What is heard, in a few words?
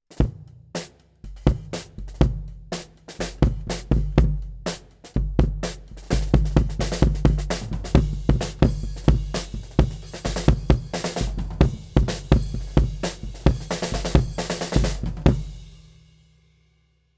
kick head on outside batter; KM84